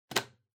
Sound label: Vacuum Cleaner Dust Cup Remove; 掃除機; ダストカップ 外す